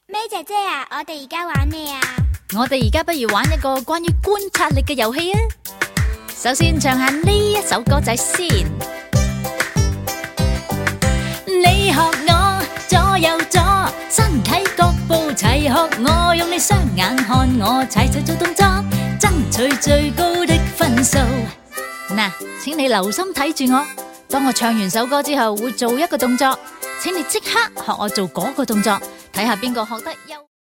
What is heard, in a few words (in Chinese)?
正向心理兒歌